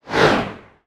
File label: Fireball Whoosh